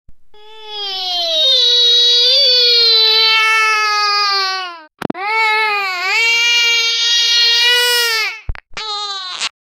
child crime

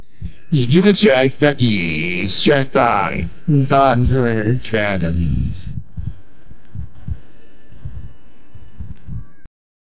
disjointed non melodic, jazz

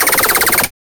pinball bonus sound, arcade

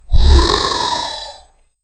dragon grunt with pain

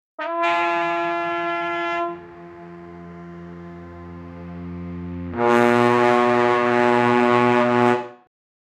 loud melodic multi tone, diesel

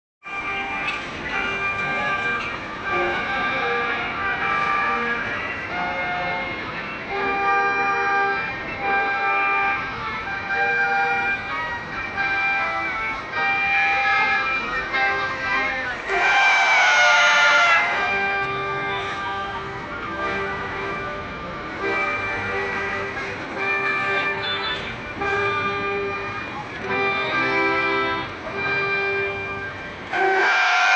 laughter carnival music c, events

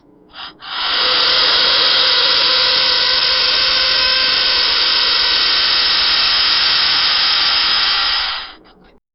a car tire filled with

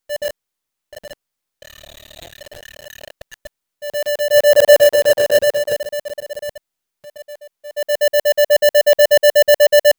response loading loop

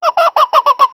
the clucking of a chicken

